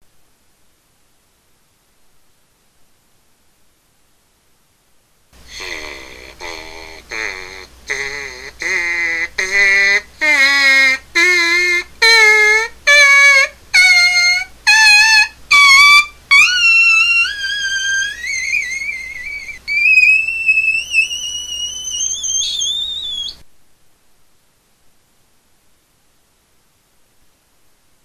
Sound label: 6 octave buzz, trumpet; 6 Octave Arpeggio Mouthpiece Buzz